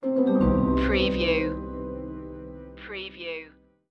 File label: silent noir stinger